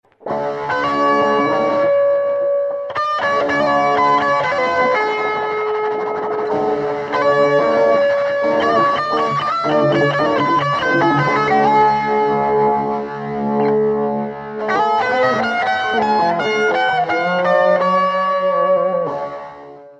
Distorted Solo

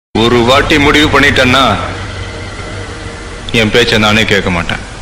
Punch; Pokkiri

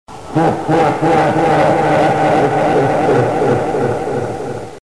Alien Laugh; Alien Baltan

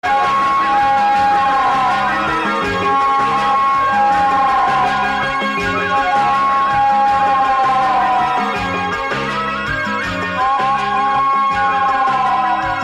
BGM

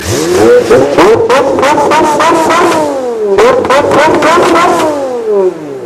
Pagani Revving Loud

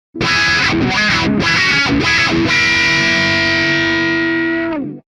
Guitar; One Punch Man

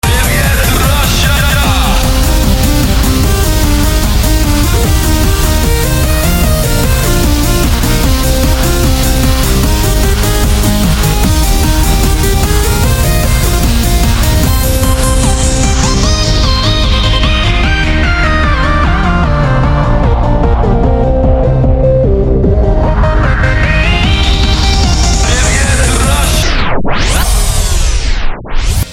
Bass

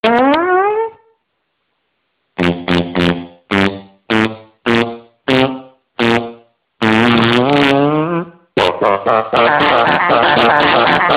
Fart Song

